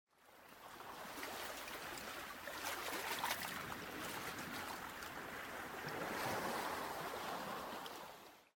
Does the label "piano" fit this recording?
No